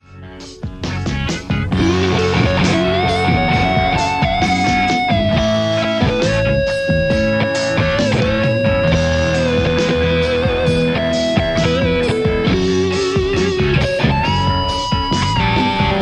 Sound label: lespaul